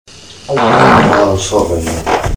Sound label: sorry man fart